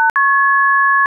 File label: cr tone; phreak; blue boxes